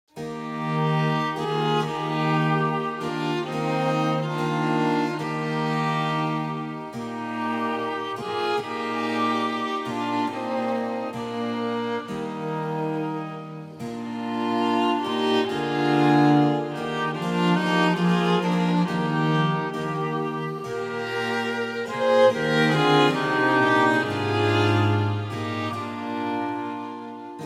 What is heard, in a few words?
STRINGS